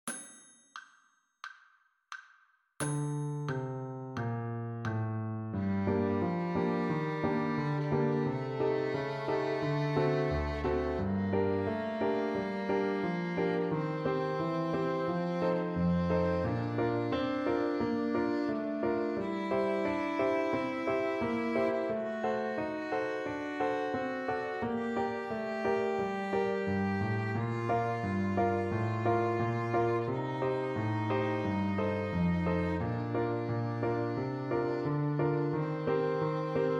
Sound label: G melodic minor scale